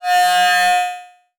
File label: Flash Beep Long; Multimedia Internet CD-Rom Flash